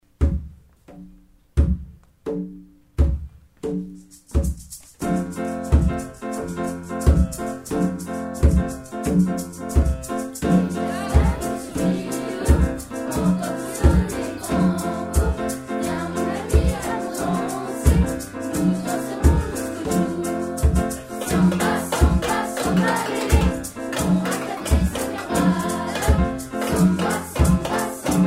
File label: samba lele